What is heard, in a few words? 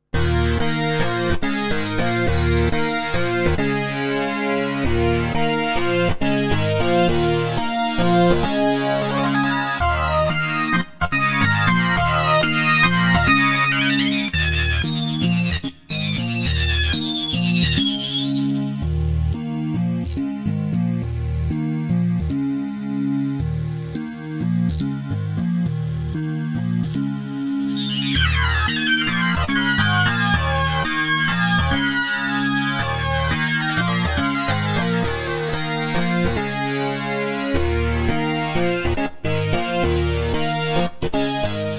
pro12 sync solo